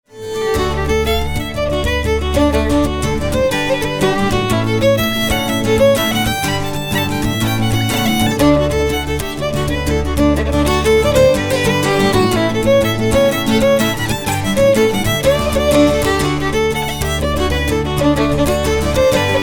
jig

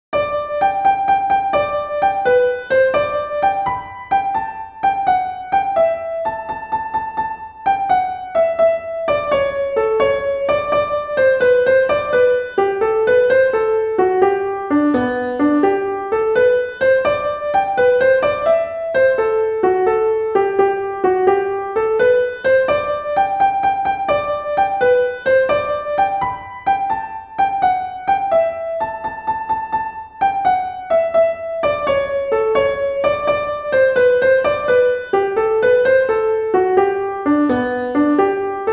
over the fence part C piano

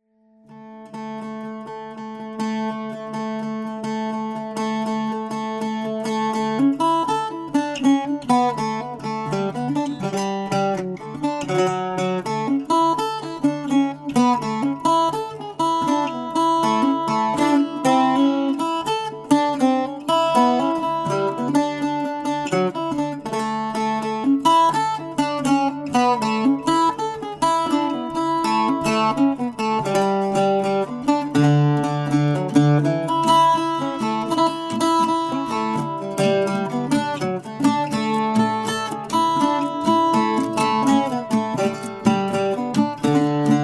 donegal lass Bouzouki slow

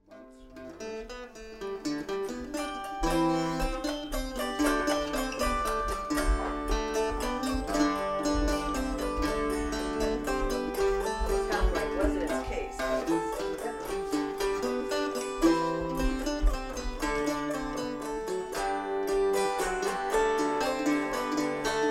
with octave

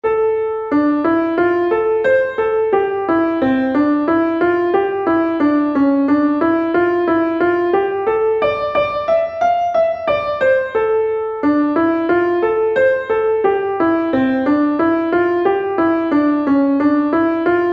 early piano slow

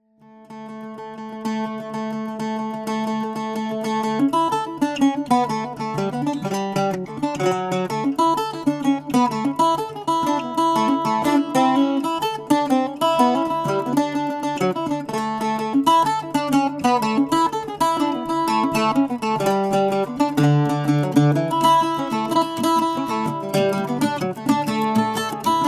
Bouzouki; donegal lass

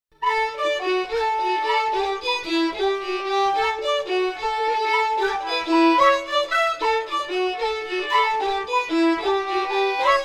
sport jig in D

